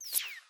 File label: Menu Weapon Assemble; Custom UI Sounds; Call of Duty Black Ops 1